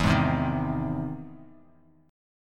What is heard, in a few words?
E Augmented Major 7th E down Bass Standard